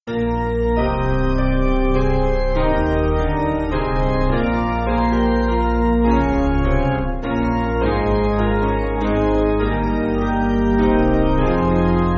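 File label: Piano, Music Backing Files for Churches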